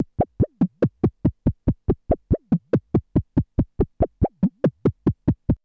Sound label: BEAT, RHYTHM; AMG - Vince Clarke Lucky Bastard